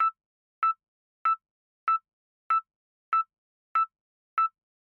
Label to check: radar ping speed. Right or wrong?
Right